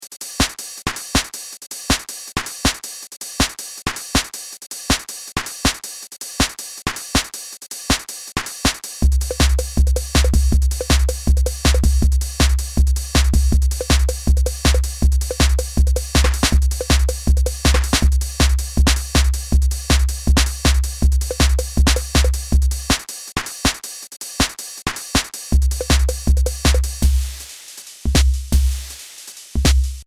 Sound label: Boom Elektro